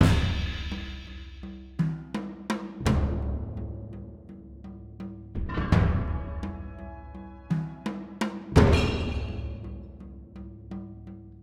Town Music Formation

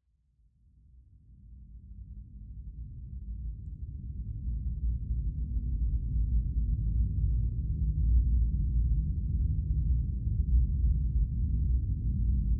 Stage End; silent hill the escape; android

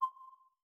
System Ng; PlayStation 3 System Sounds; PS3

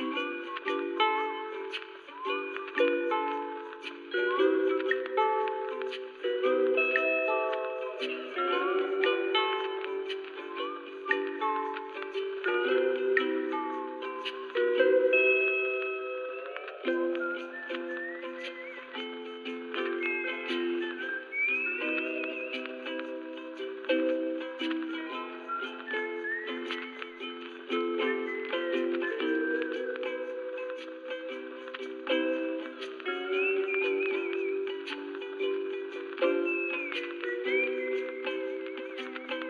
radio carib

